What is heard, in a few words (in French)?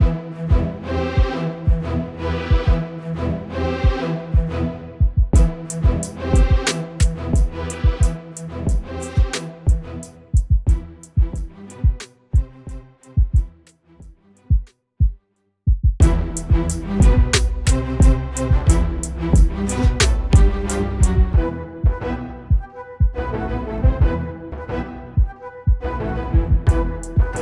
Rythme RnB